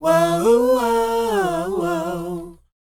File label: WHOA F, Vocal R&B & Dance; ILIO; Vocal Planet